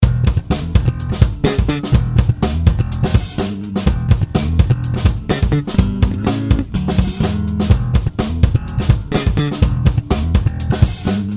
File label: fender squier vintage modified jazz bass test recording; paul leishman